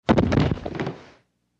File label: Body Fall Low Range, bodyfalls